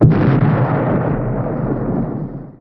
Hit

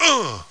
ungh; duke nukem total meltdown

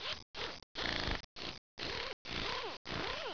impact; Image Bonanza

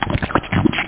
SLURP; doctorstrange2

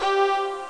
VIOLA

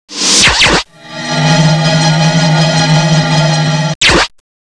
SWOSH

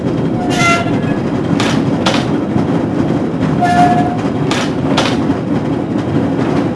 cab loop; ShadowMan

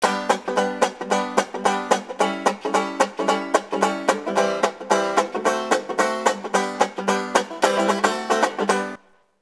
banjo

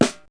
snare drum; drums

